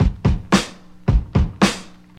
110 bpm drum groove c key, Free drum beat - kick tuned to the C note. Loudest frequency: 933Hz